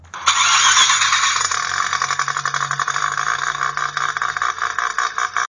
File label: mazda rx 7